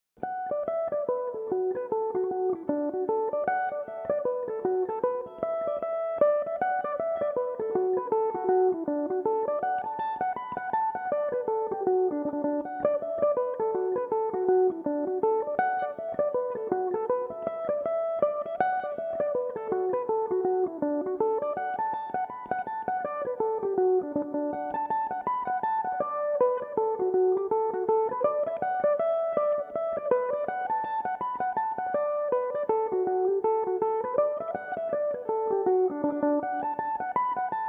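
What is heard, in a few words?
D major, irish mandolin, tunes; view across the valley; belmuse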